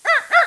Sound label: dog little